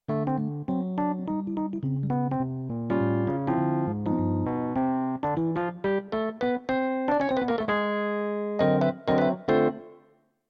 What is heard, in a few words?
2nd riff, jazz piano